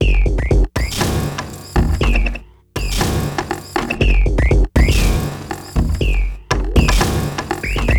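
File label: Loop, beats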